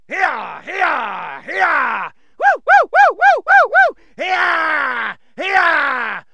Cowboy Round-up, Cartoon Yells

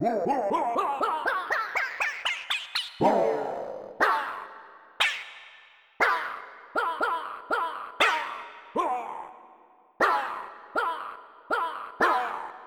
hit; Populous Demo

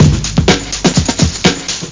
UFO BEAT; Groovy Bytes: Behind the Moon; DRUMLOOP

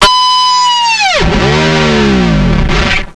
Guitar Grind; QuickShot Demo; QuickShot v1.0

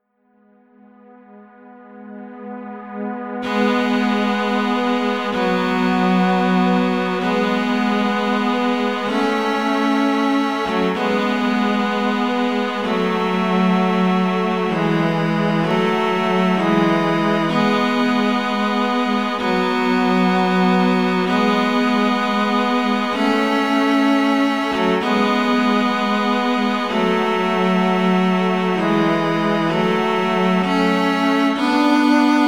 MELODY

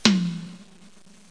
drum

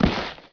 SP Land; Deep Fighter

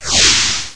WOOSH; Sirens of the Pleasure Dome

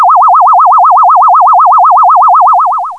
Long Phasor; Sound Library 2000; Wayzata Technology

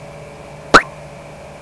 pop, People; Sound Library 2000; Wayzata Technology

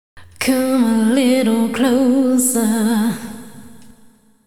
HipHop Vocal

